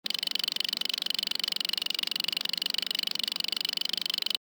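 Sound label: projector, device sounds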